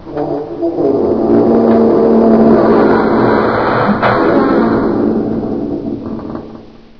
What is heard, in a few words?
ship groan; ambient; Source Engine; video game